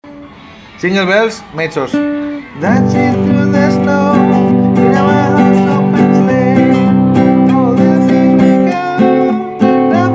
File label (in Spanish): jingle bells voz mezzo